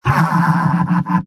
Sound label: pyro laugh evil; mvm